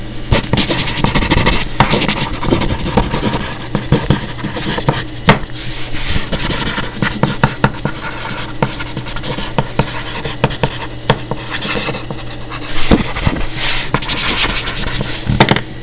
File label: WRITING